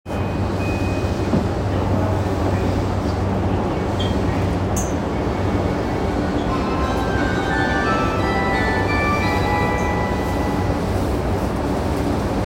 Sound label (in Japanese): 南北線市ヶ谷南